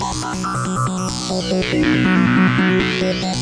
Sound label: 140 tetra2, synth and electronic loops